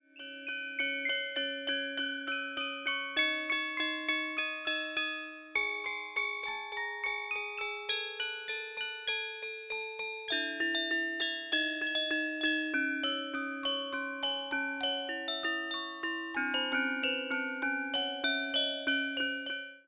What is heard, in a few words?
Glocken